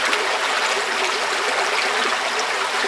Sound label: RIVER UNDER BRIDGE